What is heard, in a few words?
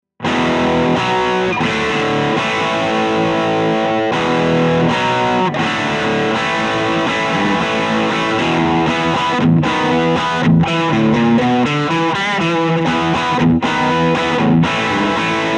rock chords; jensentone